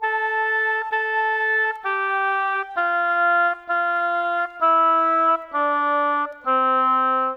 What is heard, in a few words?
Oboes; Sonic Foundry (Sony Creative Software); Orchestral VOL-2; Modern